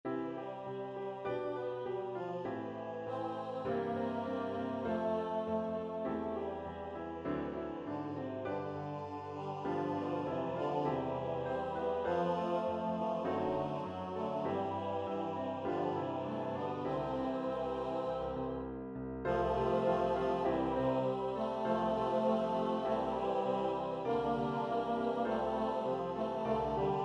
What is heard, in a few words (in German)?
Maenner Piano